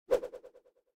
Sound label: Whoosh; Pulo; Super Mombo Quest